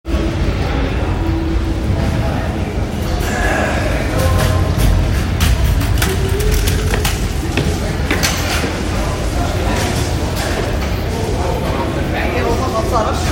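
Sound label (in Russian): звук туннеля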